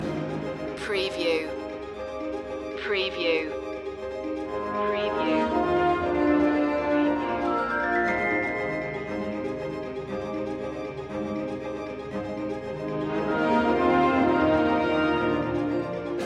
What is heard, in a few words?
eastern harbour loop